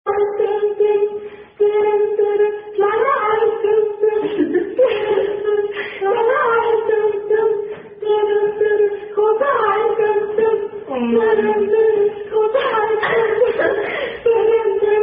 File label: Dim Dim, German Attack Song; Kleiner Hai Dim Dim